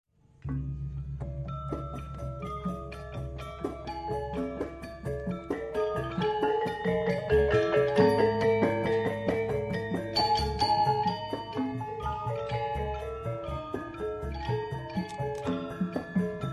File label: bali gamelan dance